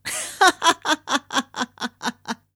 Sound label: voice laugh